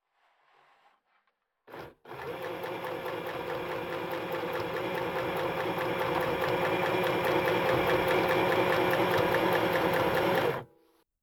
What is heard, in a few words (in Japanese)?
衣装をミシン; 素の恋人でレイヤー衣装をミシン; いえカノ～コスプレイヤーの恋人と過ごす2.5次元的一日～